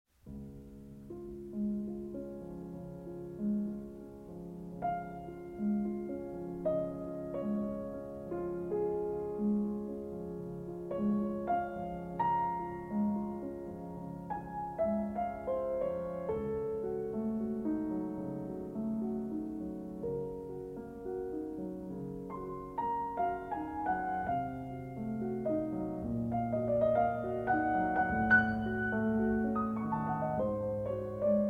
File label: Nocturnes, Lento sostenuto in D-Flat Major